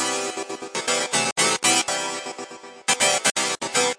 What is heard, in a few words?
120 gated-harpsichord-line, melodic loops